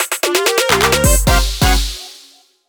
Jingle Match; Clubhouse Games: 51 Worldwide Classics

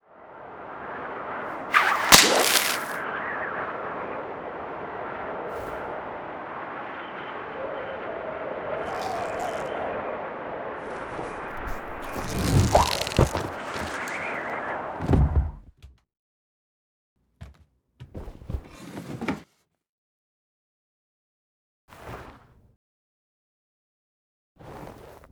Get Key

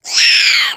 MONSTER Insect Squeel Bright

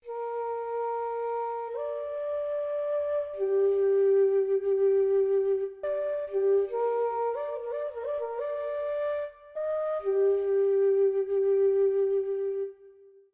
Flute Loop

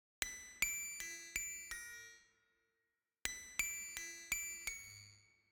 Combat Loop; Harley Police

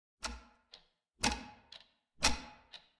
Clock Ticking; Don't Be Afraid The First Toy

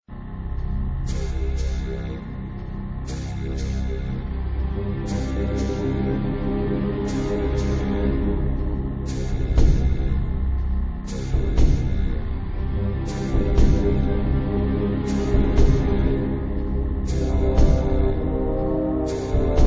Ambient; Jupiter Station

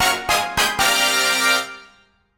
Item Obtained!; Mario Golf: Super Rush

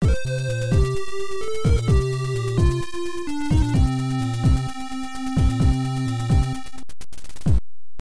Logo, Game Over; Darkman; NES; Ocean Logo

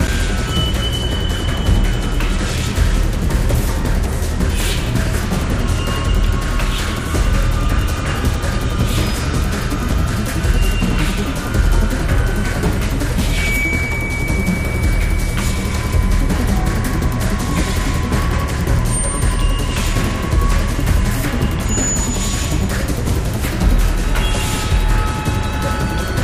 Loop Action; the walking dead season one gamerip 2012

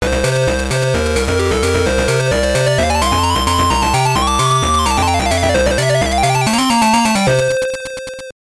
Jingle; Cruis'n Exotica; gbc

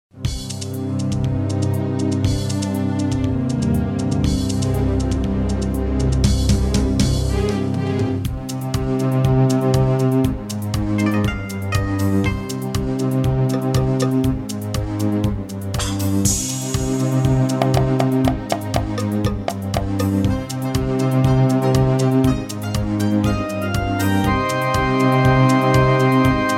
Title Theme Instrumental; Swords and Sandals 3

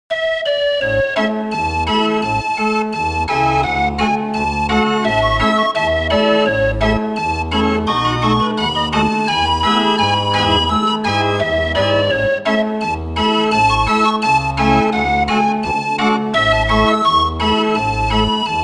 Keyboard Instrument; Assassin's Creed Syndicate

